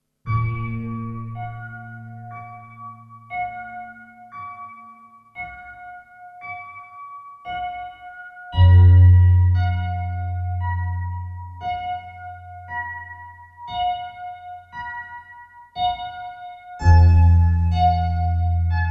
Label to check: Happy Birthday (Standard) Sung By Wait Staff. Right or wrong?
Wrong